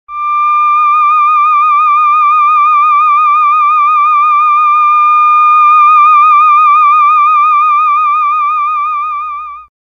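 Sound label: theremin note D sharp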